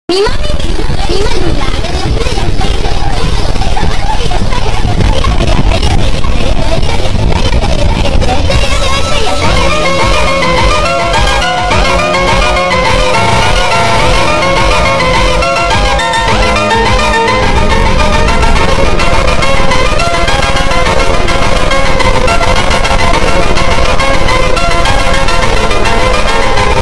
FUNK Slowed reverb

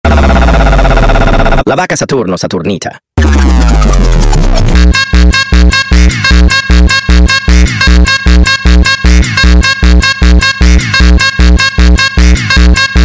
Funk speed Up

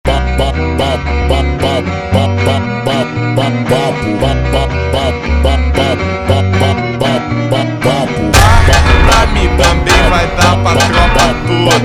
Funk slowed

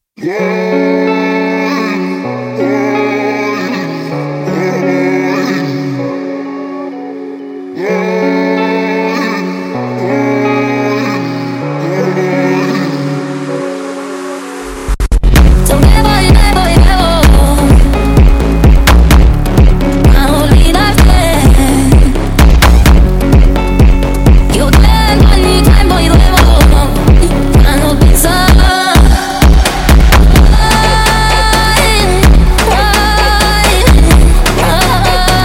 sped Up

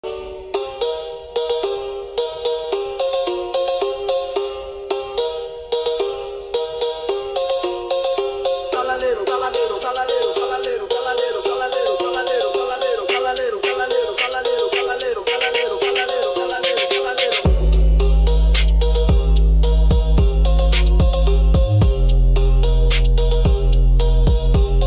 memphis Phonk

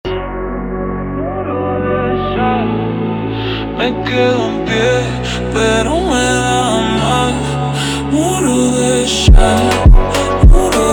Jumpstyle super Slowed

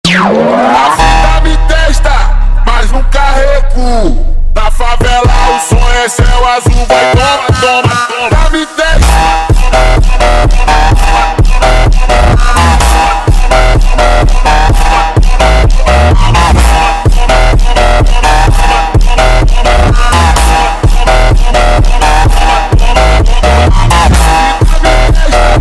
Funk slowed

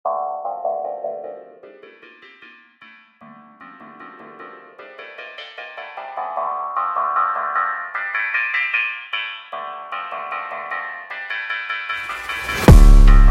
phonk; Skibidi Toilet

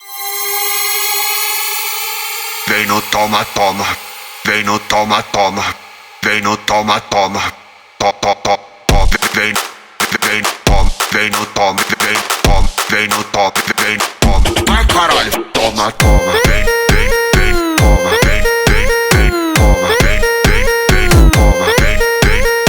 Brazilian Funk

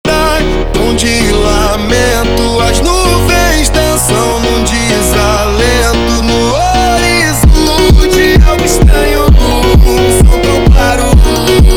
Funk

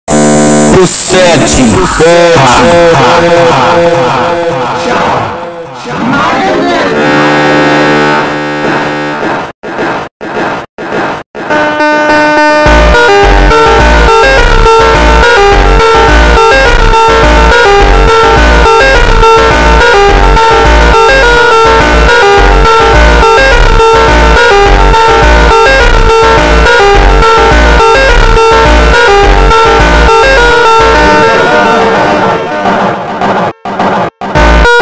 FUNK Slowed reverb

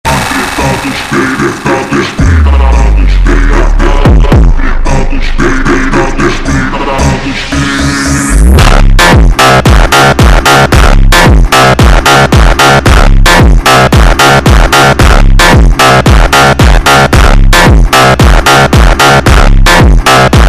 Funk Mutante slowed

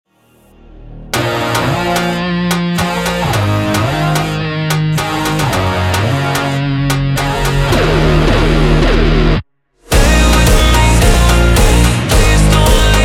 Jumpstyle Rock Version slowed

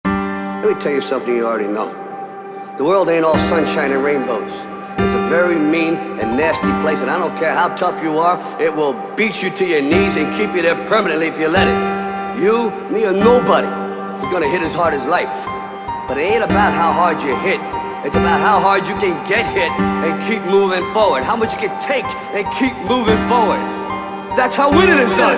Funk sped Up

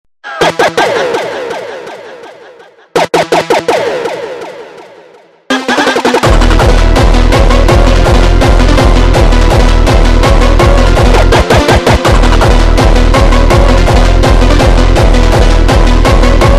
Hardstyl3 sped Up

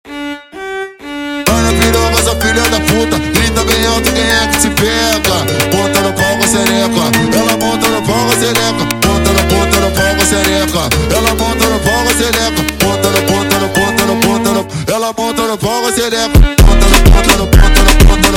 brega violino slowed

